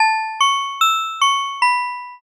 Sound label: music box